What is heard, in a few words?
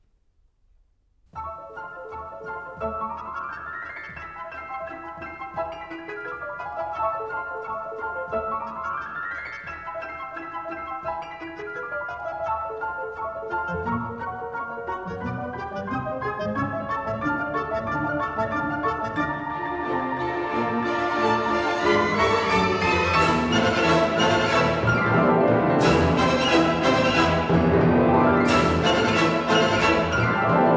Piano concerto no. 2 in A - Allegro animato; Insignia- Repertoire Highlights